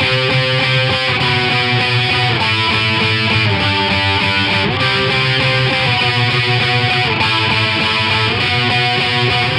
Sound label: Charisma Melo Guit B